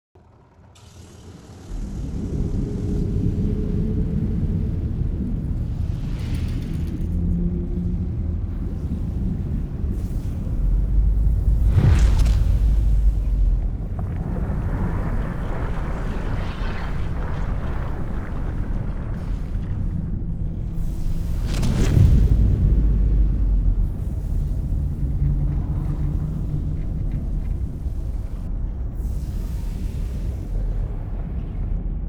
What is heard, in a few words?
ship colossus close; aquatics